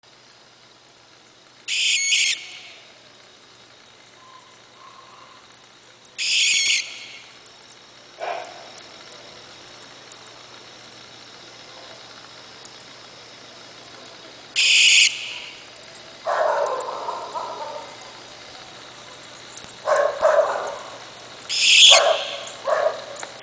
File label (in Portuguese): Som Rasga Mortalha